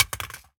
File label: phys mag drop smg poly concrete, magazine drops